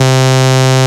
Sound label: Basses C; Vengeance Samples